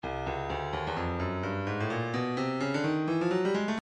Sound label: breakbeat midi files 125 129 bpm 128; Stylus Breakbeat Midi Files